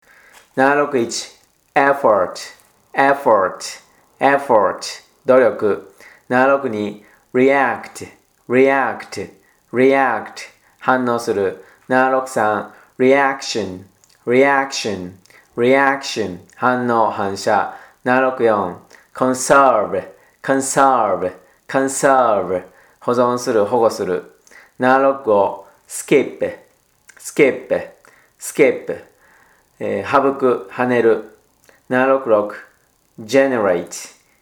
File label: tango